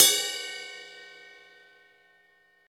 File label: drum crash sample f sharp key, Royality free crash sample tuned to the F# note